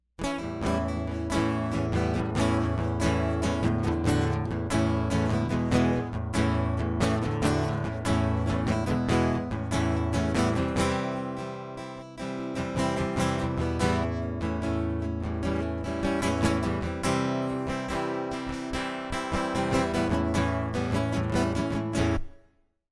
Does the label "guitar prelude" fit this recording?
Yes